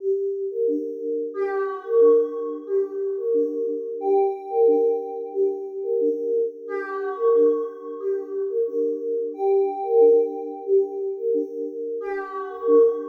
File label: Quirky amazment